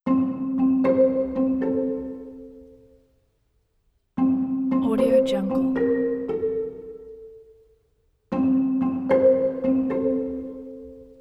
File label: clumsy funny background; mylesproductions